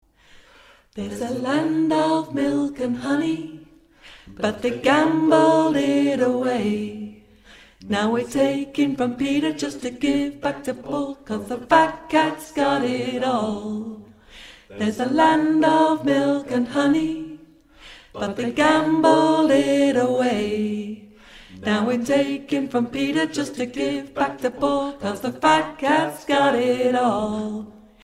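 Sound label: tenor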